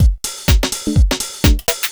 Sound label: HOUSE BEATS 125